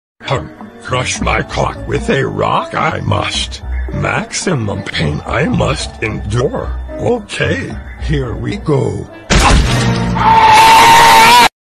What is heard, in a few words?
pain